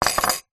household bowl with metal fork in set down on wood; zapsplat